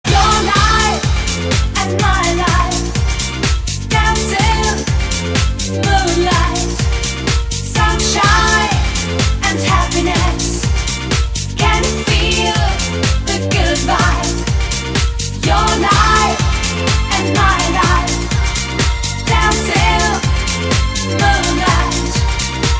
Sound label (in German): Gesang Song